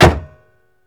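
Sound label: TRUNK SLAM, Car; E-MU Producer Series Vol. 3 – Hollywood Sound Effects; Transportation; VSI Car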